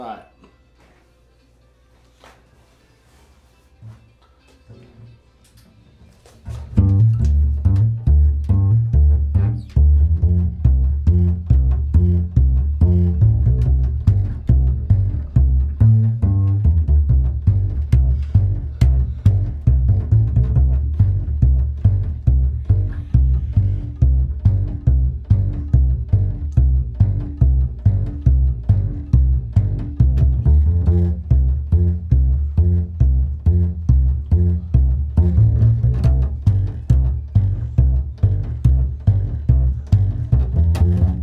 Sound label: bass